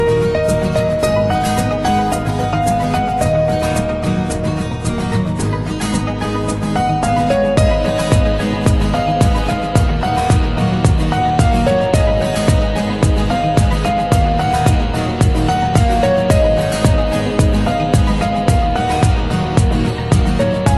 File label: Ringtone Instrumental